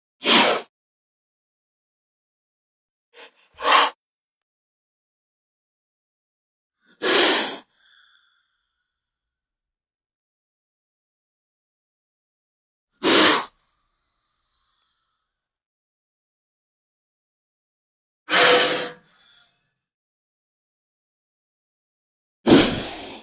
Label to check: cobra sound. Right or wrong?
Right